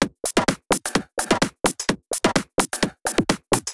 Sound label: Loop, 128 BPM